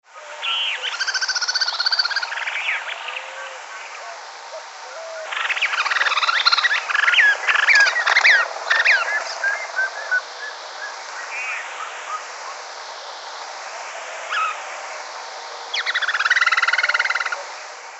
Suiriri suiriri affinis, Laguna Blanca

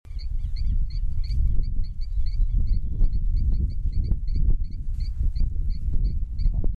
Leptodactylus diptyx

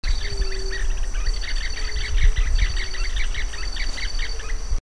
Capsiempis flaveola duet

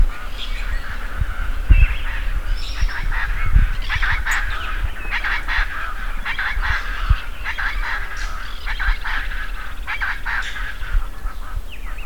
Ortalis canicollis

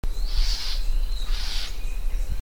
Conopophaga lineata antagonistic

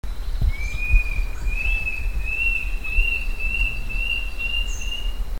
Mackenziaena severa; Song; recorded PROCOSARA, PN San Rafael; Paul Smith March 2007

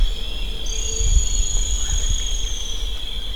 Picumnus temmincki

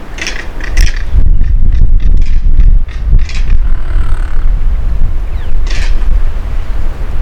Caracara plancus

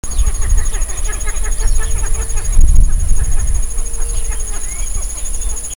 Podilymbus podiceps

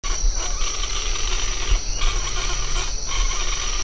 Syrigma sibilatrix roost

